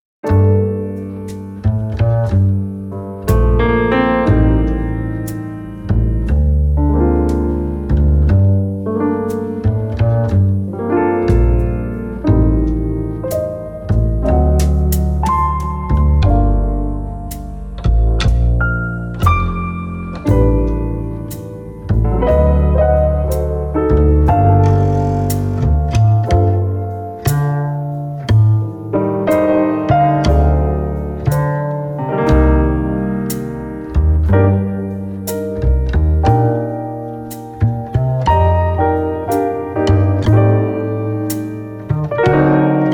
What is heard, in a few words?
Slow Jazz Ballad, flutist; Practice Song No 10; using major minor pentatonic scales